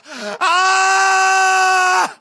scream, Screams; Voices; civ13; civ14

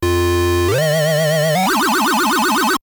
sting, Arcade; civ13; civ14; Machines

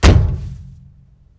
metal thud

